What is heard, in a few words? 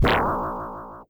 dreamstruck